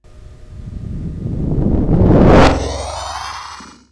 gate; gate to island

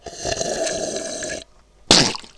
swig spit

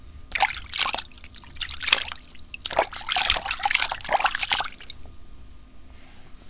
hand splash

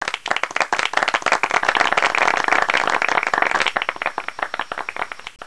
crowd claps

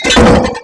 door

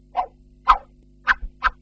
lasso

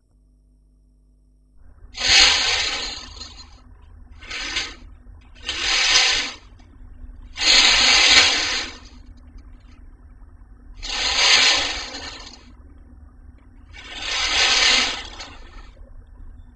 hospital curtains

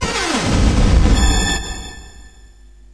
burst zap

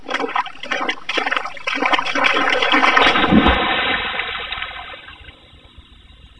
wade in

